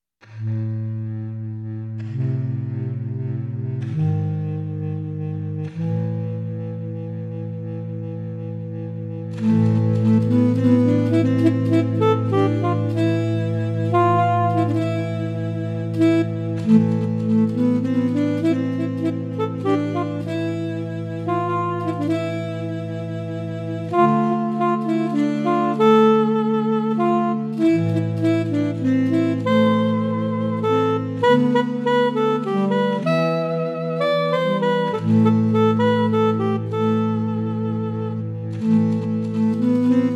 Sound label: Waltz Sax